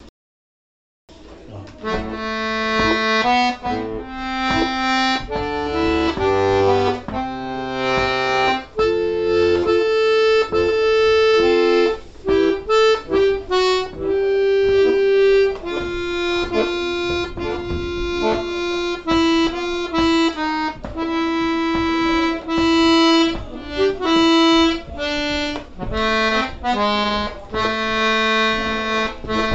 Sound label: Polka